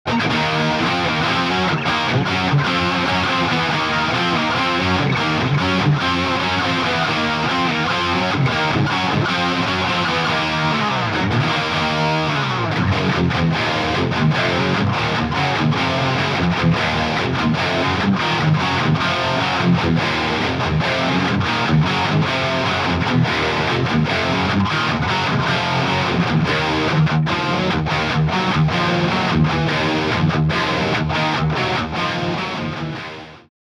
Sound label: Electric Guitar Isolated